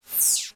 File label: Title Transition Laser Short; Production Element